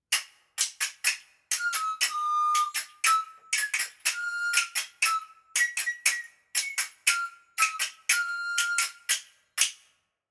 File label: Panpipes